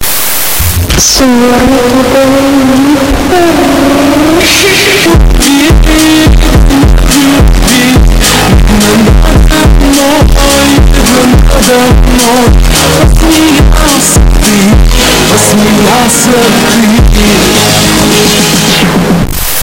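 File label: car audio mega bass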